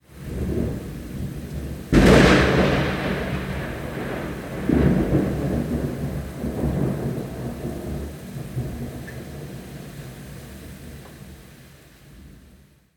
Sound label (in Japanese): rakurai